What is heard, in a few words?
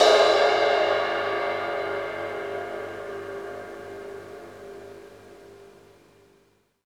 Crashes & Cymbals; Percussion Samples; Drumkit